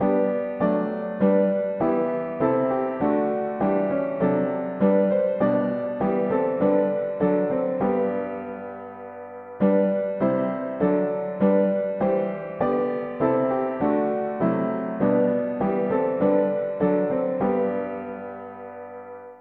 mono piano; hymns; O Lord we know it matters nought

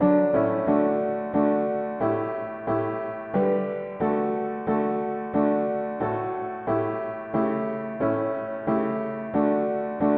mono piano, hymns; Now in a song of grateful praise

